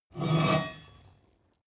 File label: metal impact midsize rich scrape